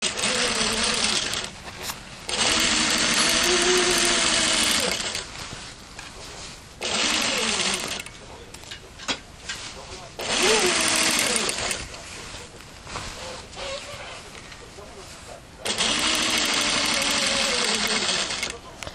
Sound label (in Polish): questing dźwięk